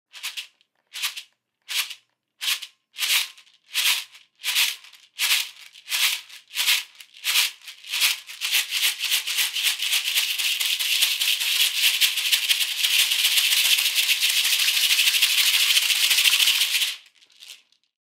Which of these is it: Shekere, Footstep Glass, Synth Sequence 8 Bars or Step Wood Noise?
Shekere